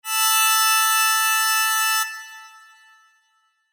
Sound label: Sho